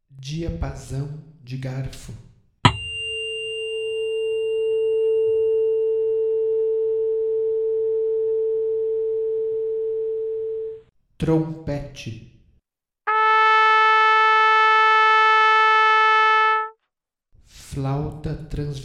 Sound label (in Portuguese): comparacao harmonicos